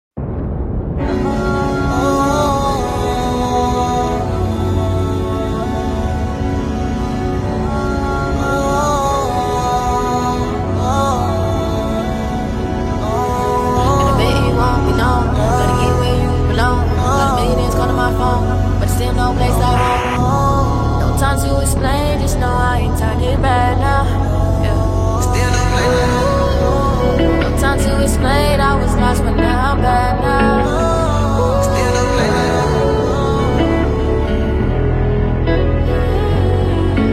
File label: Interlude